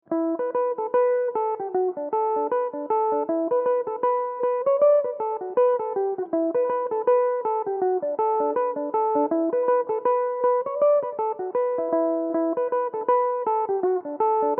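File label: reel E dorian, Irish mandolin; Molly Ban; Belmuse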